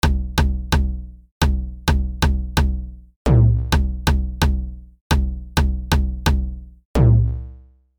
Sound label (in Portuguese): BEAT ROBOTICO